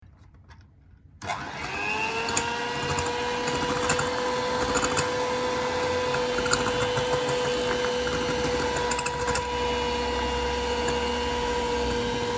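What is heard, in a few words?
kuhonnyi mikser, kitchen mixer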